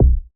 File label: trap kick; Hiphop